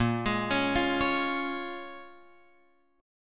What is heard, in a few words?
Bb Minor 7th, Guitar, Open F, chord; Bbm7 arpeggiated